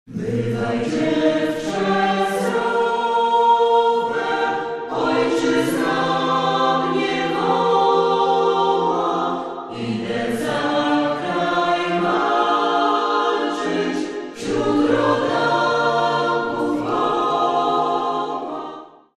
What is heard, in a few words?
acapp